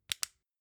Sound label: flashlight off fast